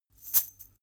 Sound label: chain mail march single left